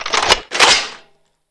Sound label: bolt pull; FY71; cstrike